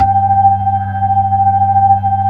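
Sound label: B-3 Brite, organ; Roland LCDP10 Keys of the 60s and 70s 2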